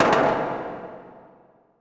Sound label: amb hangar misc; Earth and Beyond; EA Games